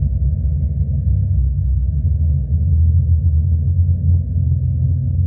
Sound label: ship idle; Stellaris